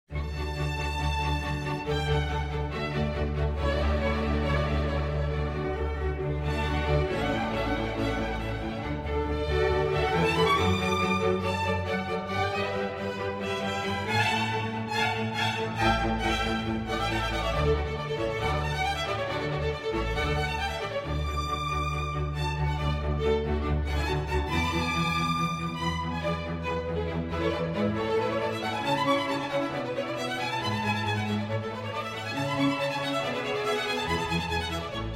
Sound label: Divertimento in D Major, Allegro; Divertimento in D Major, K. 136 Salzburg Symphony No. 1